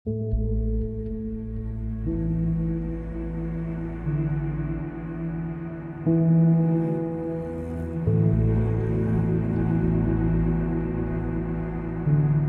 Cello Ambient Improvisation

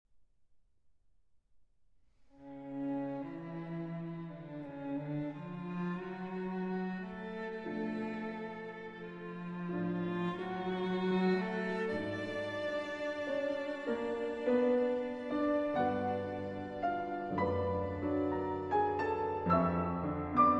Piano Trio in A Minor, Lento espressivo; Op. 150 II.

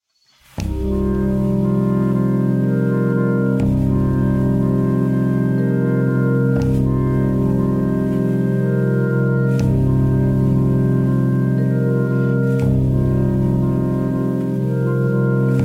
Clarinets, Vibraphone, Cello & Percussion

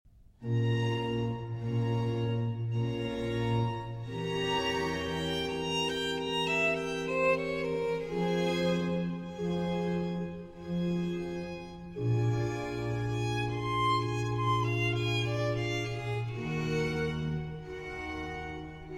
Concerto For Violin and Strings in E Minor

